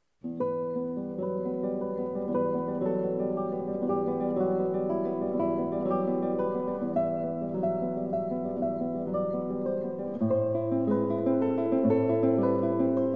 string quartet

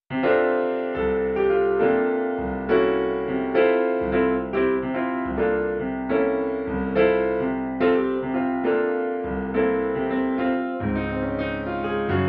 jazz trio

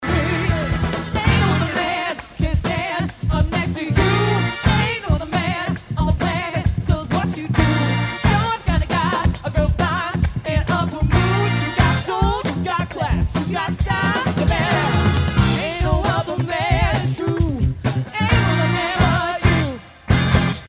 Dance Band; Cover Band

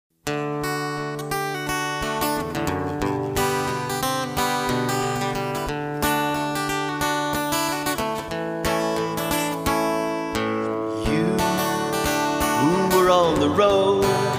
Oldies Acoustic Guitar

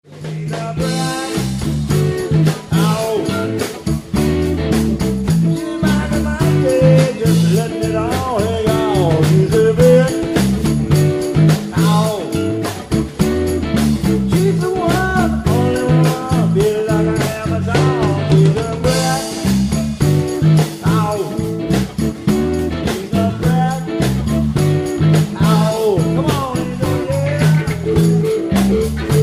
cover band

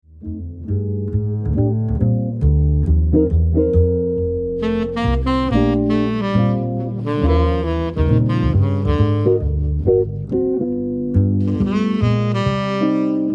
Jazz Trio

